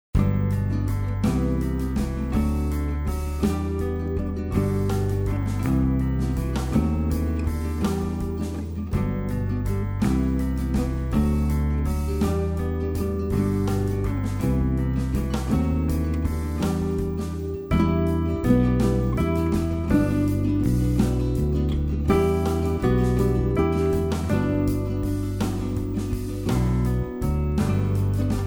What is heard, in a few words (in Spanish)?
backing; los cochinitos